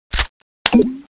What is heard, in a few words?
reload clipin, weapons; bloodhunter